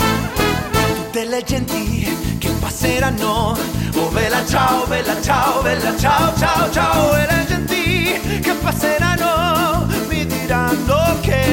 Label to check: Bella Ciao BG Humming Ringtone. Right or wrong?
Right